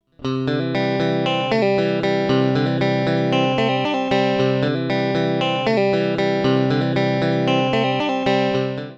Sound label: chord phrase; fretjam